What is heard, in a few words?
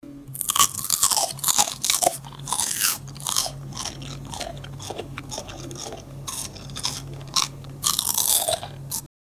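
eating one potato chip an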